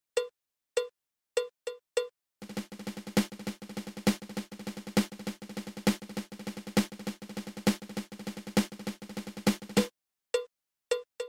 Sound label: Double Ratamacue